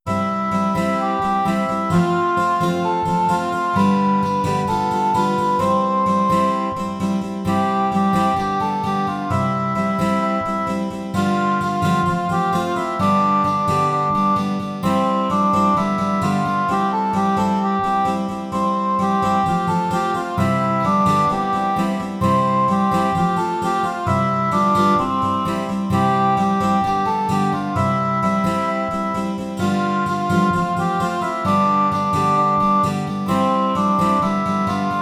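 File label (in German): Lasst uns froh und munter sein, Gitarre C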